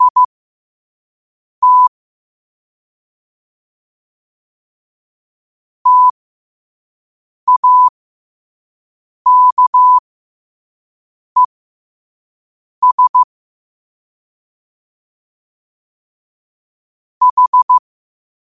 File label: 5 wpm, morse